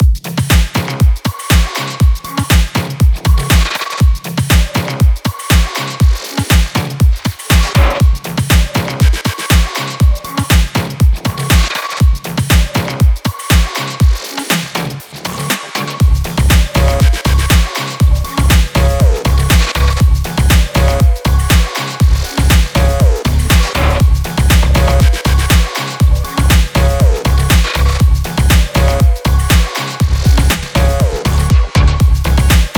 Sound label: Heavy Electronic; Heavy Electronic Music Pack Vol. 2